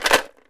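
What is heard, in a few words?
ELM RATTLE, PERC; AKAI S6000 CD-ROM - WorldSounds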